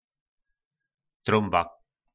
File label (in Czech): Tromba